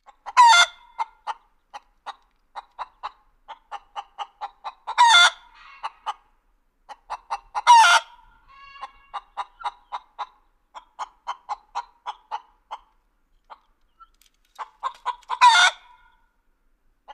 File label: Chickens Clucks Farm